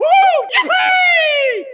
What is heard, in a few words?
YEEHA BOMB